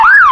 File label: yelp, sirens, whelen; emv; whelen std